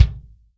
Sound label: TIGHT KIK, TIGHT KICK